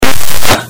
incredibly loud

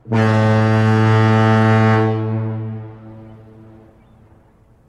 touchdown foghorn; 49ers

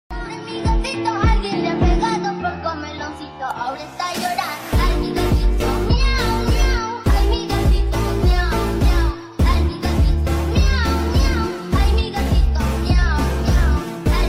ay mi gatito funk

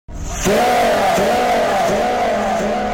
slow fahhh

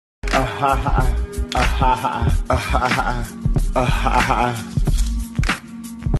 uh haha

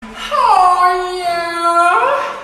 hoyaaaa

